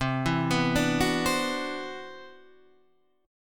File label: C-Major Sharp 11th, Guitar Drop D